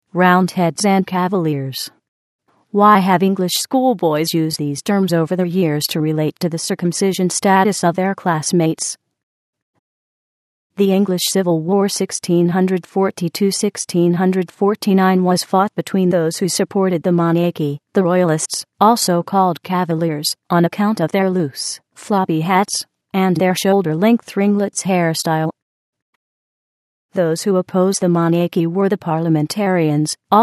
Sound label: Voice Synth; Crystal